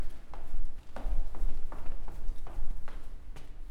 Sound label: Down Stairs Fast